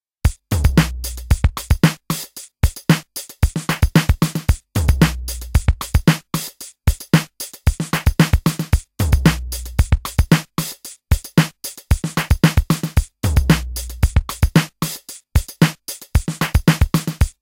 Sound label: DMX PATTERN